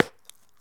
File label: Bubblegum Pop; SFX Kit; Foley - Misc